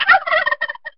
Jingle Thanksgiving

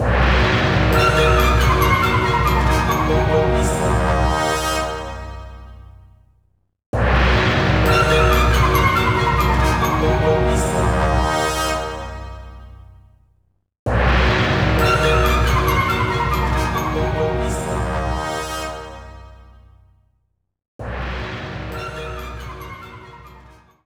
Jingle Failure; SAS Anti-Terror Force; windows

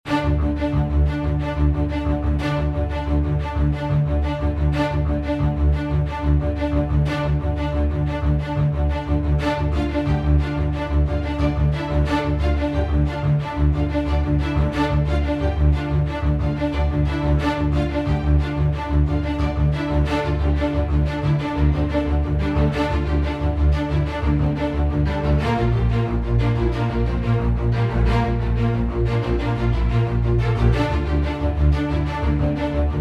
Music Game

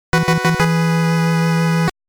Fanfare 2 (Recruit); NES; Fire Emblem Gaiden

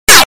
Wielding a Sword

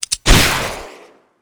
module flares

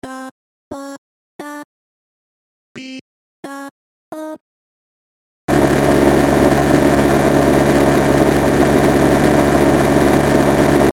laugh; Friday Night Funkin' Manny Edition 2022

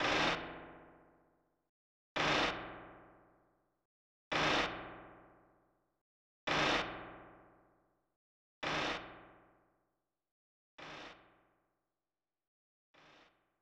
Creaking Floor; Gakko de Atta Kowai Hanashi; SNES

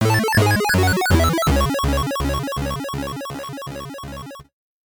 Game Over 8bit

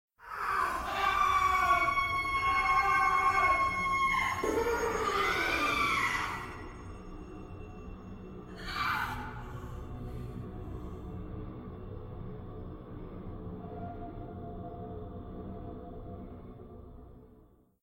Screams; don t be afraid the first toy; David's House

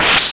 Blade

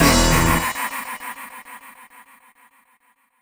Retry Jingle; Friday Night Funkin' Wario Funk It OST mod Windows gamerip 2023

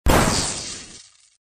Sfx Wizard Skill; Mighty Knight 2

